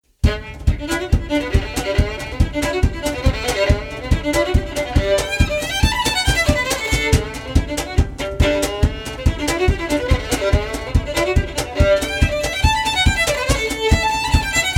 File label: Farewell To Ireland, Violin; Daisy Jopling